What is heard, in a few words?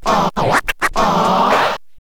scratch kit, vinyl